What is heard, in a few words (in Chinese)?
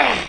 男受伤; renlei